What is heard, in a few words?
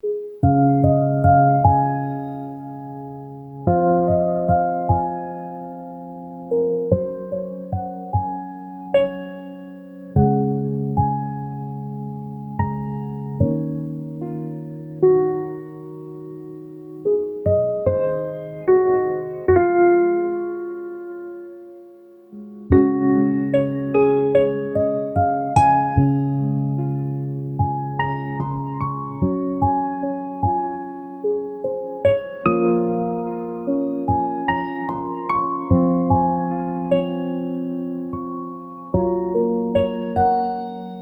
piano calm